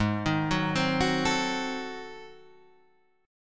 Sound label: G sharp 7th Flat 9th G sharp down Guitar Standard, chord; G#7b9; arpeggiated; JGuitar